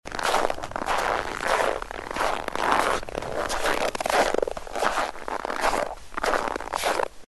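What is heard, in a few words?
winter forest sounds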